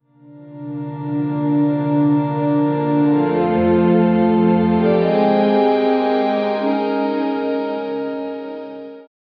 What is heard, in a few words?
soft ending theme slow mo